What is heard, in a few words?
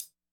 disco hihat